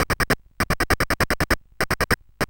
Musicbox, CSG8580R5; hvsc

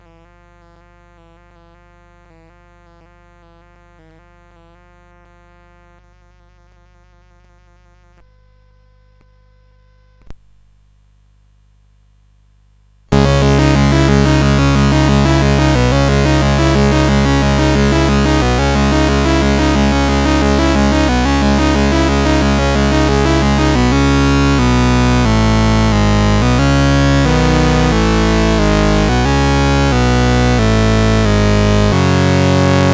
Synthetic Metal, CSG8580; CSG8580R5

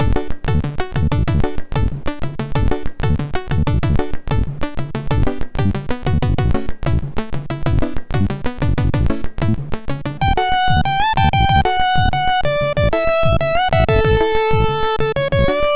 Dance, MOS6581R4; RetroMagazine

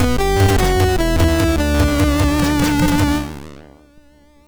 Mutant Refrigerator, MOS6581R2

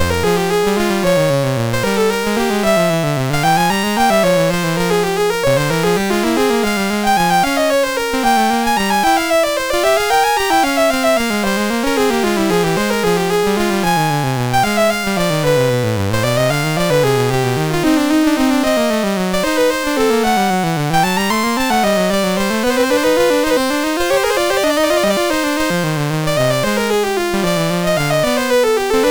Two Part Invention, MOS6581; MOS6581R3

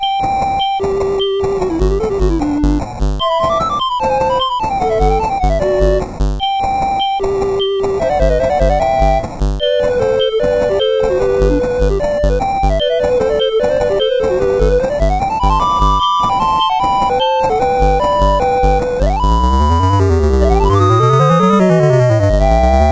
Mozart Duet, CSG8580; Syl

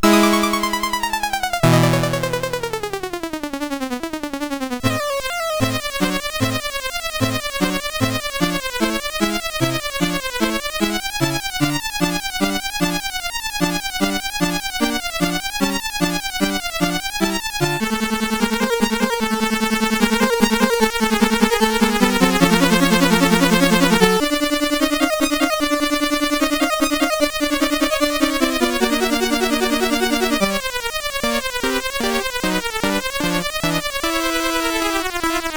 Flight of the Bumblebee, CSG8580R5

